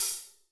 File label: Cym Wet Half, Alesis D4; Drum Machines